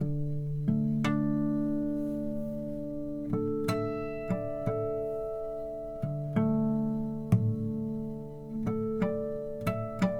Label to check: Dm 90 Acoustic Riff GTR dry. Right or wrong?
Right